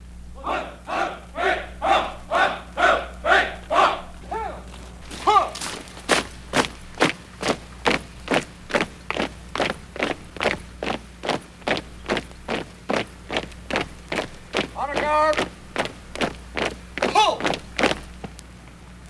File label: Troops March Count